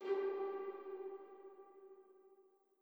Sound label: chime old; PS4; Chime Sharp